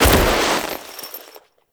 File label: foley roll; Warframe; Characters; Kahl